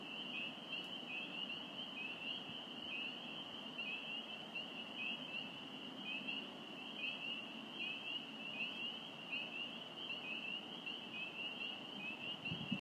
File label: Spring Peepers